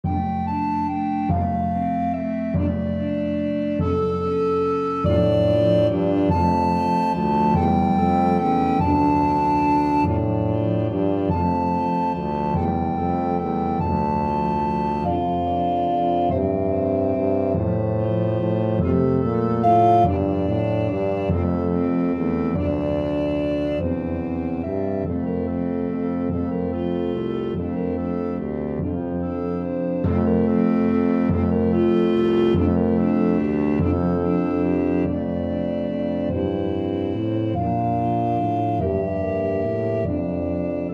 Tuba Track with Melody; We3Kings

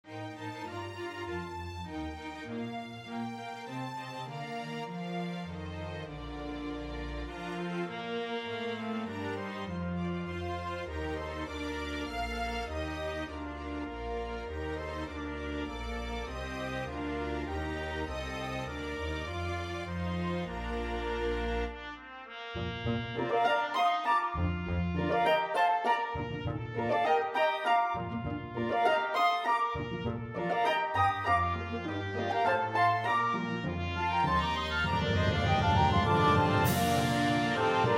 Trumpet Track with Melody; The First Nowell

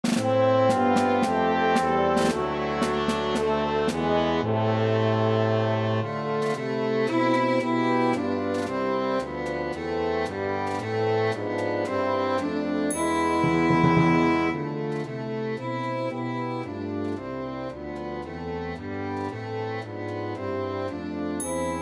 Violin Track with Melody; God Rest Ye

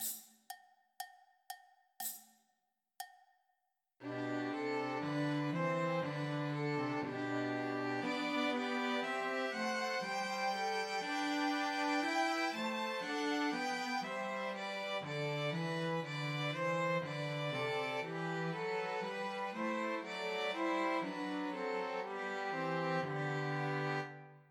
Christmas Trios for Two Violins and Cello; Unto Us a Boy is Born

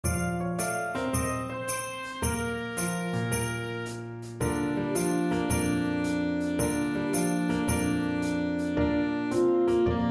Jingle Bells Saxophone Track with Melody

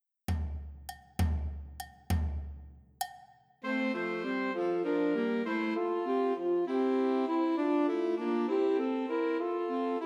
Trios for Two Altos and Tenor Saxophone; Christmas; Sussex Carol

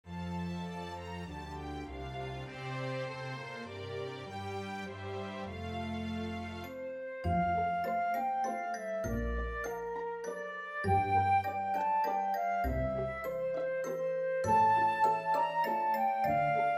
Flute Track with Melody; We Wish You A Merry Christmas

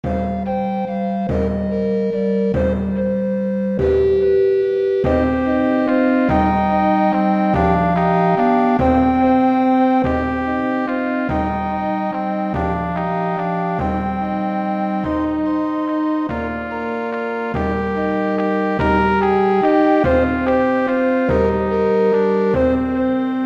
Trumpet Track with Melody; We3Kings

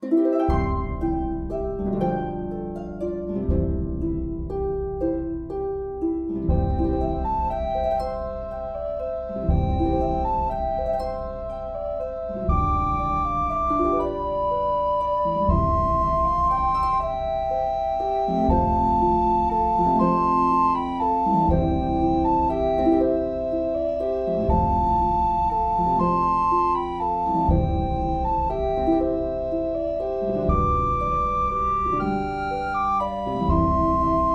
Recorder track with Melody; Silent Night

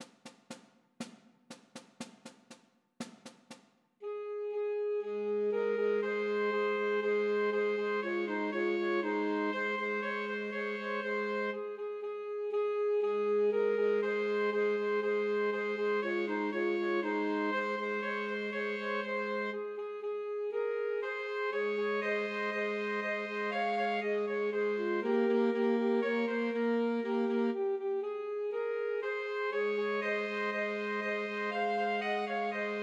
Christmas Trios for Three Alto Saxophones

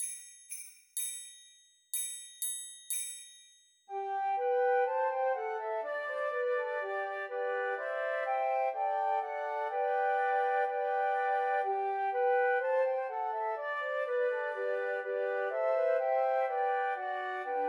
Trios for Three Flutes; Ding Dong Merrily on High; Christmas

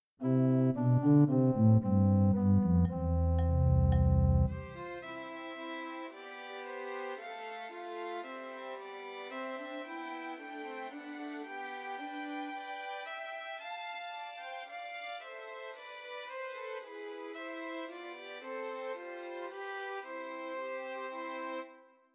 Trios for Three Violins; While Shepherds Watched; Christmas

